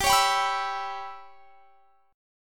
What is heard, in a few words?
G 6th Flat 5th; G6b5 strummed